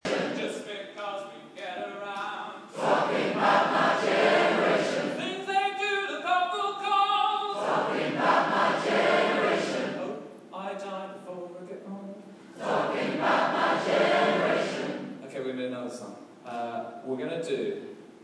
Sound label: singing; at generation infinity